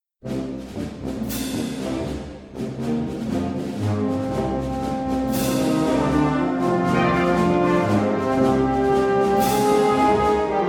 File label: Concert Band, Vivace